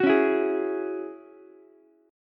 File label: Gb 7th Suspended 2nd Gb Ukulele; strummed; JGuitar